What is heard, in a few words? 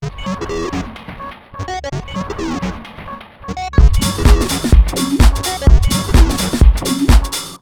127, Disco House; USB Soundscan vol.20; Fresh Disco House I; AKAI